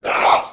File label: Demon